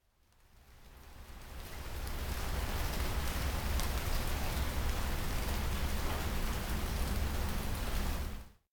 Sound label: rain start; anemoi